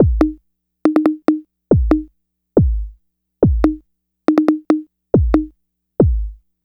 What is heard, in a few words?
Beat Box Loop, Drums & Percussion, Electronic; Sonic Foundry (Sony Creative Software) - Electronic Point Blank